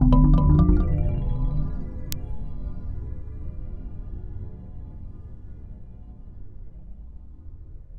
Chyme; Sonic Foundry (Sony Creative Software) - Future Retro Dance Excursions

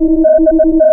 ETHER, Anime Sound FX, SIGNALS; AKAI Anime Sound FX

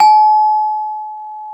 BEL CELES; Roland - LA Composer vol. 1

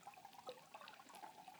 Waterway D Light

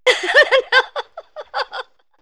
Comedy Positive Reaction, vox; vox mix; X-Static Goldmine

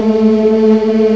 CHOIR, HUMANS; M8; CMI Factory

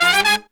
120BPM, Horns; 90 s Sample CDs; Zero-G; Phantom Horns

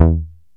HIP, HIPHOP; Rubber Chicken Software Sample Bank

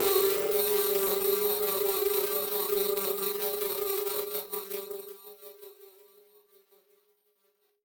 Battered Synth; malfunctioning synth samples

